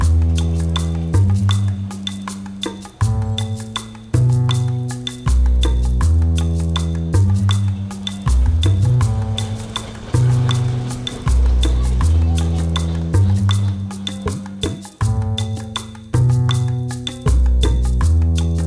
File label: chan chan sound